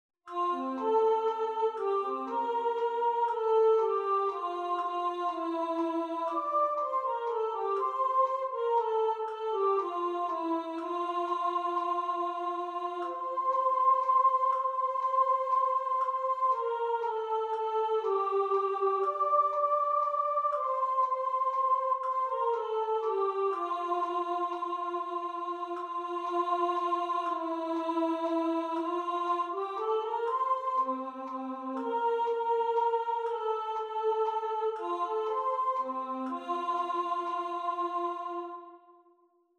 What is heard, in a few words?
DONA NOBIS PACEM musique; CANON